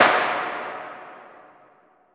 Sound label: back snare